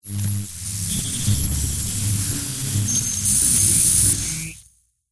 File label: Electric Discharge, Magnetic, Science Fiction; Blast Wave